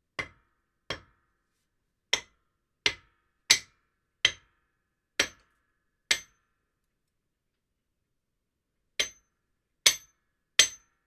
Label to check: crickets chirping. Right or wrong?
Wrong